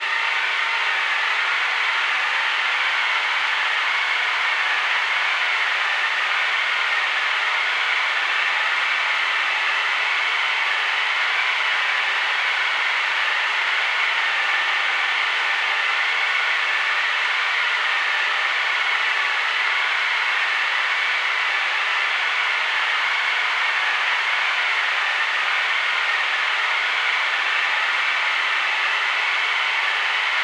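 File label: Design Eerie, Sinister Textures; InMotionAudio; Sinister Textures Volume 2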